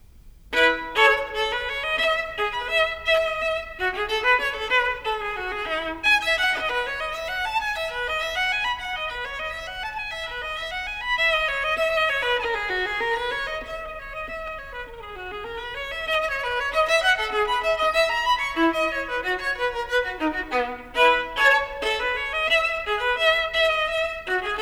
Partita No.3, in E, Solo Violin; Bach; Szigeti; 6 Sonatas and Partitas for Solo Violin 1991; BWV 1006

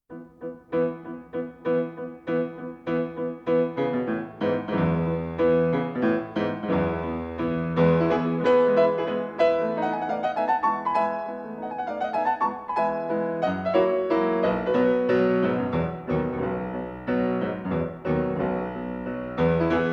Mazurka in E major, Piano

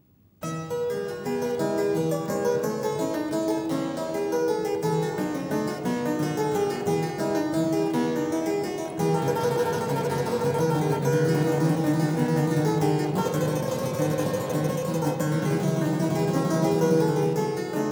Prelude F Major; Prelude No. 11; BWV856; Bach - WTC - Tilney

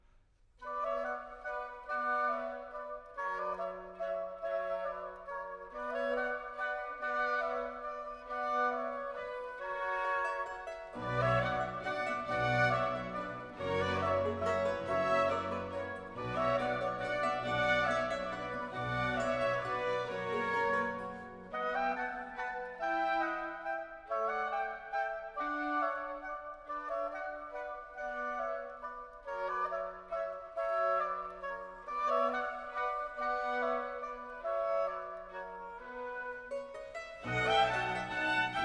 Sinfonia, Larghetto; Antonio Vivaldi (1678 - 1741); Vivaldi - Ottone in villa (2010)

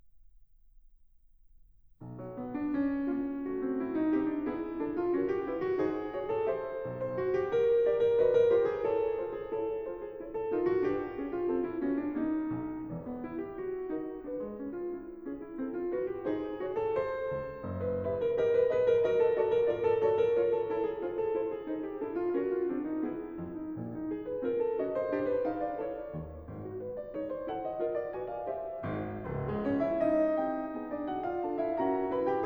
Preludes for Piano, Allegretto tranquillo; Martin

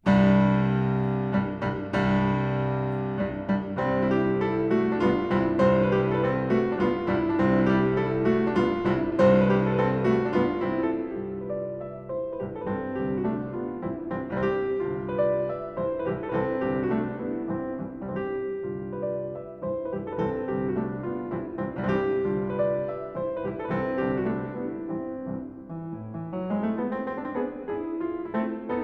Nocturnes No.05 in F sharp minor, Op.15-2, Piano; Chopin

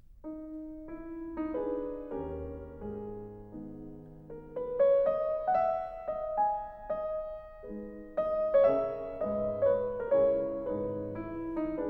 Mazurka in G sharp minor, Piano

